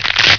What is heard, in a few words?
fall; opentdm; Hover